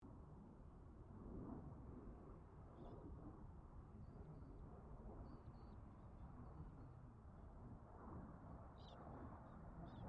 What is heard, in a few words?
AIRCRAFT CA-27 SABRE PASS DISTANT; CA-27/F-86 Sabre; Airplanes; Vehicles